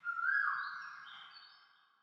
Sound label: tropics birds; ambient; War Thunder